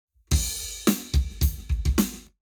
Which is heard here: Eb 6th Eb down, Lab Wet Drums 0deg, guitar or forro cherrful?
Lab Wet Drums 0deg